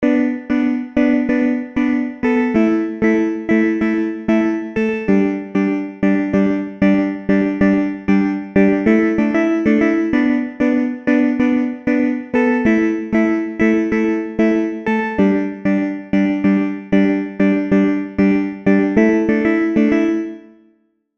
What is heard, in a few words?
glitch stutter